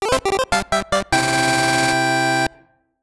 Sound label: Round Clear!; Kirby's Block Ball